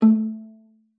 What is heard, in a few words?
A3, VIOLA PIZZ; Xsample VOL-5; 90 s Sample CDs